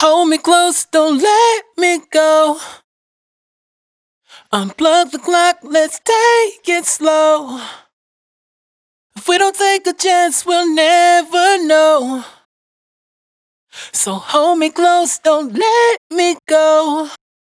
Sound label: Dry Vocals hook Dont Let Me Go 100 Eb; Raw Vocals; Slate Digital Omnivox Vocal Sample Pack